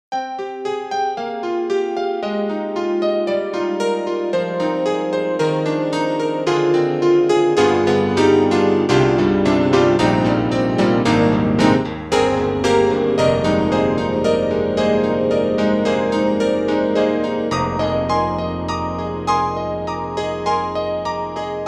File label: KORG KRONOS 2 Piano